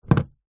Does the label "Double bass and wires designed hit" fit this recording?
No